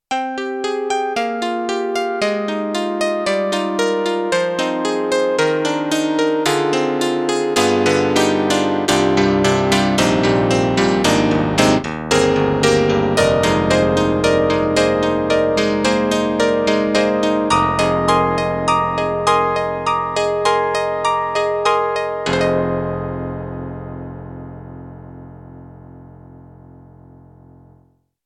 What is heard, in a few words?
SD-80 Piano; EDIROL